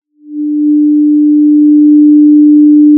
Sound of a cruise ship horn

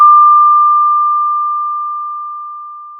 Sound of a doorbell

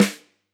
close Rim, drum

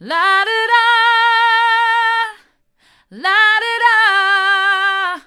la do da hard, vocal

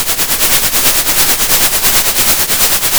pop, Sound of a train

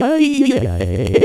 pleasure low; VIVAE

